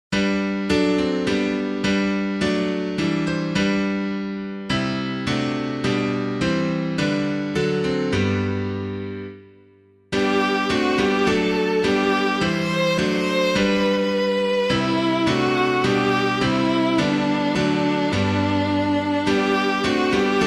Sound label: piano; Faith Begins by Letting Go; Daw Jr.; DIX